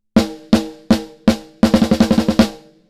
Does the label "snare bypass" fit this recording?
Yes